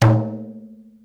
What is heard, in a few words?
rebana pah